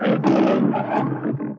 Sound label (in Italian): Tigre